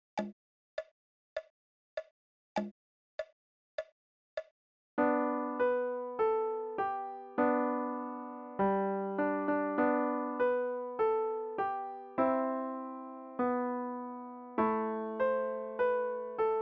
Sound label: Jingle Bells Slo